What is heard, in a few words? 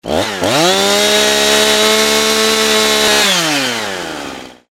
chainsaw ringtone; husqvarna